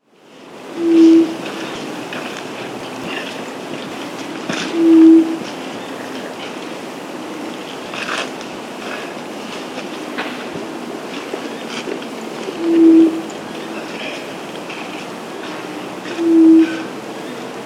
White-Throated Quail-Dove (Geotrygon Frenata), Andean Birds; S. America